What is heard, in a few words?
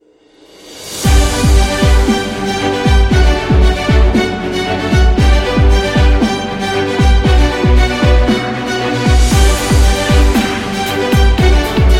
Orchestra, epic music; epic music vol 4